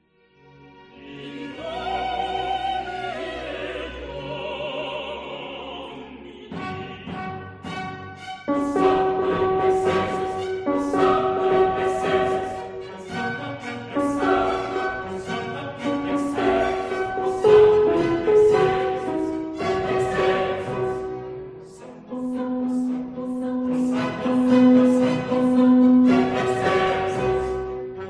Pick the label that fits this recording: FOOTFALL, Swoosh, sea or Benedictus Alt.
Benedictus Alt